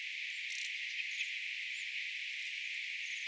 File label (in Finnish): onko tuo tilhi